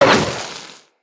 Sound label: Fire Arrow Impact; Level Events; Midrath; Warsong Prologue